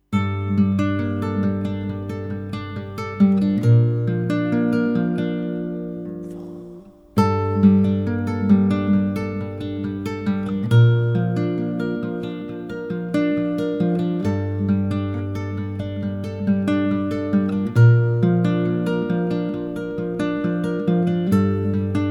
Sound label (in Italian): intermezzo per chitarra classica flauto e bouzouki